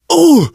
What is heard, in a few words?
hurt minor, survivor voice, manager; source engine; left 4 dead 2